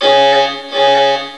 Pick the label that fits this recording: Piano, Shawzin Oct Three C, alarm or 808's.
alarm